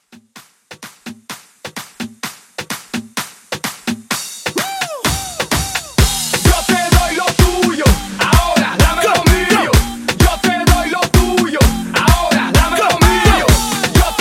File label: Latin Hype 128